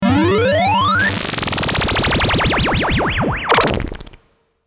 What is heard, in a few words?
Ringometer SFX